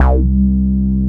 synth bass C3, house bass

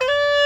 BA WU C 3

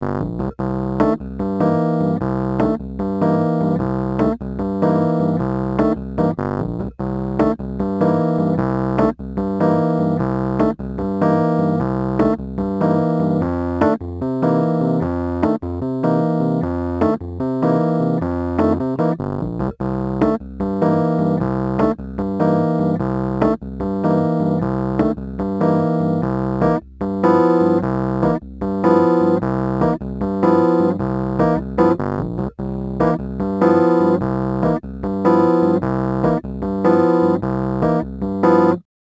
Rhodes Attacks; Ueberschall

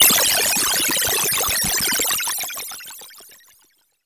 INSECT, Special FX Twisted; USB Soundscan; AKAI

